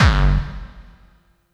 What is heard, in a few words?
Bassdrums Hard Kicks; Vengeance Samples